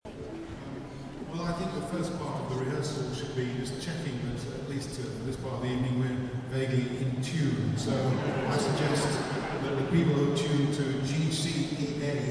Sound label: ukelele ode to joy rehearsal